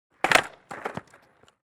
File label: Skateboard ollie